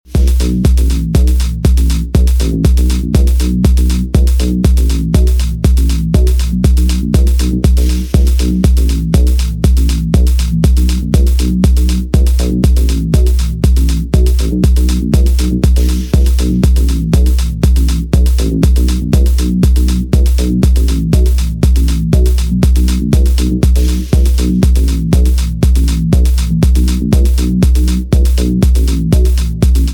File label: corrosive trance